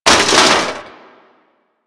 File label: Metal Damage, Impacts; Warframe